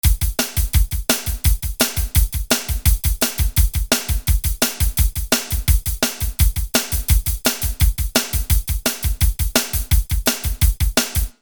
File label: Punk